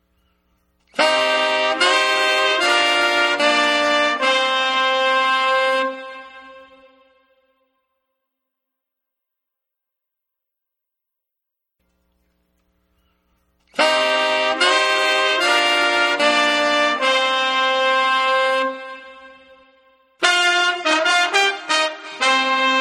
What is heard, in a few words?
horns